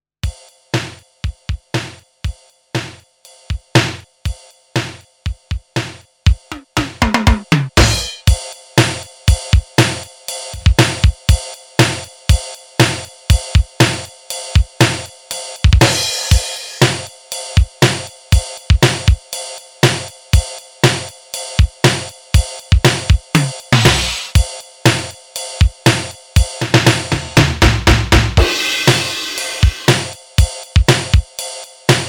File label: r100